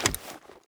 flip, knife; weapons; Counter Strike Model Pack; CS2; knife ursus

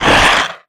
grunt, antlion; creatures; vox

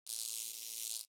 substation oneshot; Counter Strike Model Pack; CS:GO; nuke

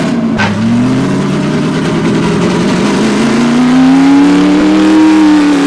junker, vehicles; Half Life 2; Episode 2

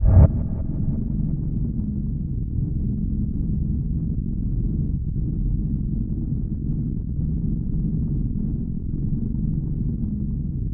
creep enter; Counter Strike Model Pack; CS:GO; survival

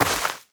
step, footsteps player grass

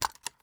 rotate weapon, ui

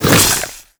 grunt soft, headcrab black; creatures; vox

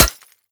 glass, bullet impacts; physics; Half Life Model Pack

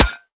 pottery, bullet impacts; physics; Half Life Model Pack

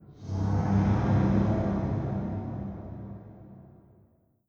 vox wet, strider, combat, idle; creatures

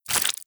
debris flesh, bullet impact debris

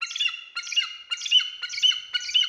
eagle; ambient; anubis

